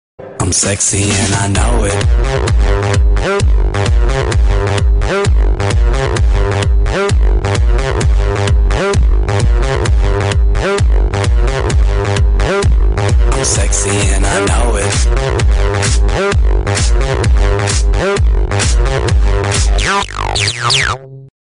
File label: music